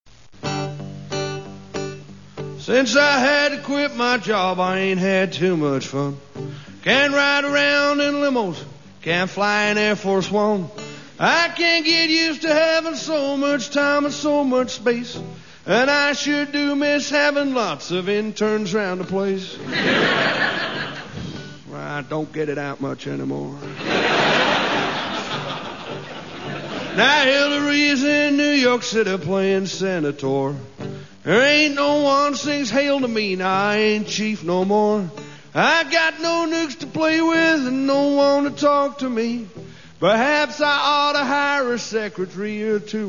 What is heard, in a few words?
c blues